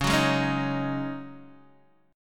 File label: Db Suspended 2nd Suspended 4th Db Guitar DADGAD